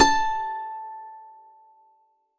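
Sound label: dulcimer A5, Tuned Percussion; Polyend; Simulacrum Sounds; 1 Shots; Acoustic and Electric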